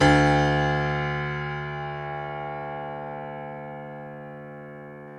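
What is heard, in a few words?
piano, Grand piano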